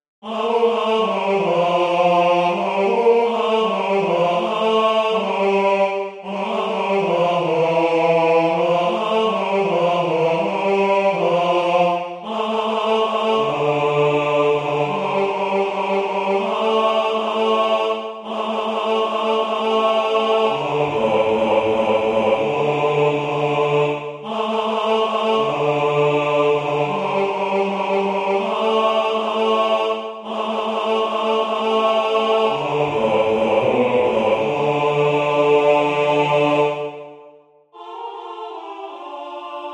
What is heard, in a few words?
bass; Song of the Wise Men